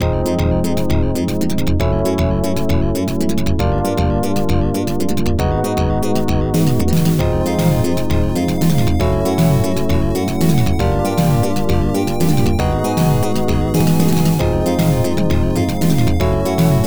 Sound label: Count Down, Continue; thunder force iv